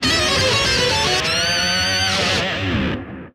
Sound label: scatter Win Guitar; hellskitchen